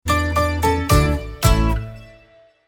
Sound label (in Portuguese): SINAL SONORO